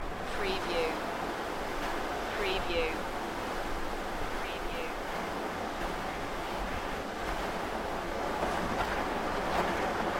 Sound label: Skiing, Snowboarding, Snowboard or snow sledge slide on snow (loop)